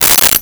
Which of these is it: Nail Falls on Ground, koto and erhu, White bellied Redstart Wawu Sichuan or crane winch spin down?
Nail Falls on Ground